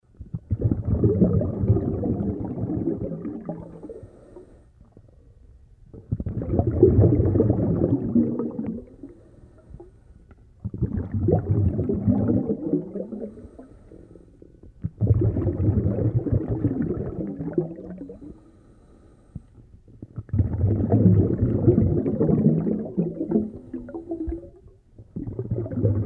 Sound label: Diving, a man swims underwater